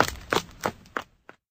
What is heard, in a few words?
runner, zombie dice; game; cli-games